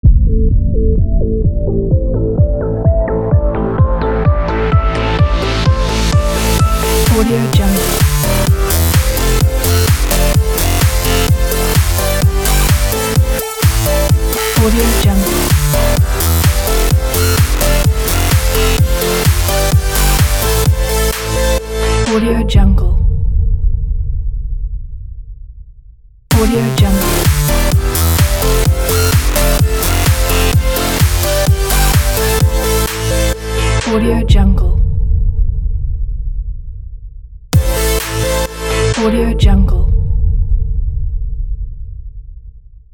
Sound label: active energetic logo